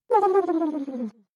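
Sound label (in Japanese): スライム 撃破, モンスターボイス; voice monster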